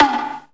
SE GAME BREAK; maimai

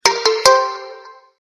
Sherbet Default message, notifications; 三星

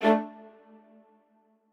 strings

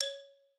xylo

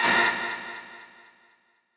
stab

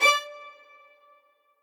string

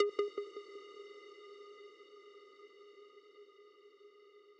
arp

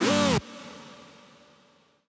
chord

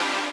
lead

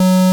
bass F#2

